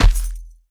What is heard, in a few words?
Footsteps Dirt Run; The Town